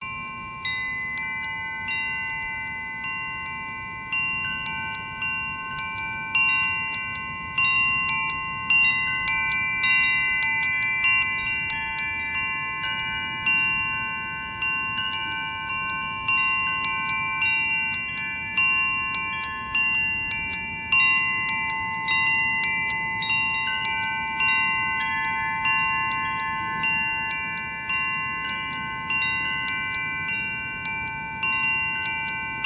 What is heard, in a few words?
wind chimes; Bells